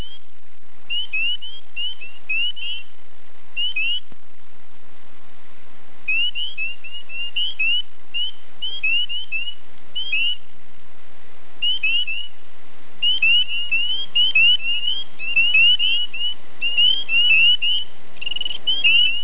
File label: peeper; frogs